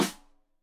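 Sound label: Snare Stereo, Drums; Deeply Sampled Drums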